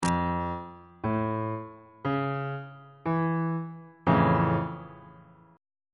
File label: e sharp augmented major 7th chord on bass clef